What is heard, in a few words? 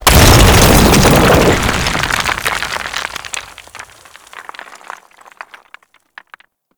Asteroid Rock Explosion; Warframe